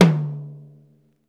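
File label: tom high, Roland; Club-50 - Foundations Roland; Ext.Snare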